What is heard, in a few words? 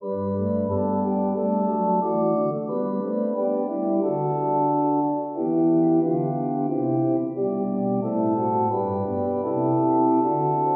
Organ